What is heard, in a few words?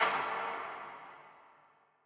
wood hit valhalla